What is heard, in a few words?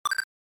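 Game Boy General Pitch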